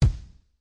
Kick Gothic; REAL LIVE KITS; Osama Qureshi DrumKit

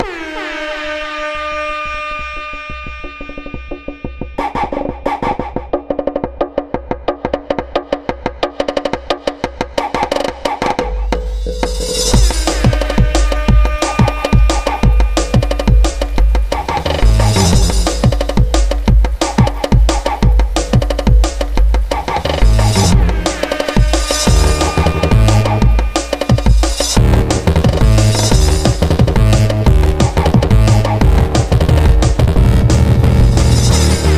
Rain Dance

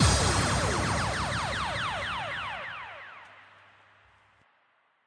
drop kick; Punches Hits Discoblasts; single hits

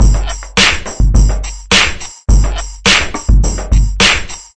funky vibra clap, 105 bpm, drum loop; 101 to 110 bpm

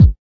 kik

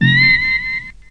teradactyl; Weird and Interesting Hits; single hits

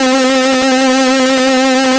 Synthesizer Middle C Notes, Lead; multisampled; synth keys

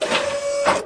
machinetool slide into place; Weird and Interesting Hits; single hits

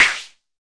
mbrute sn, Arturia MiniBrute

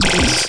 ratatat; Weird and Interesting Hits; single hits

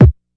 classic hh kick; STYLE KITS (Hip Hop, Etc); Classic Hip Hop Mega Kit

